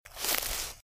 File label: Footsteps - Bush walk - Left; Eden; RadwinIsland